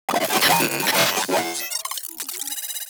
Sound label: Vocal Idle; Moa; Vocal Set D